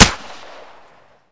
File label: p90; type19